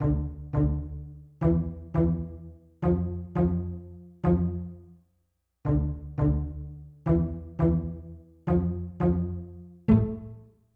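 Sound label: Hiphop R&B Low Pizzicato Dm; R&B Funk Soul; Digidesign; Pro Tools; Pro Tools Essential Demos; Essential Loop Demos; Demo Session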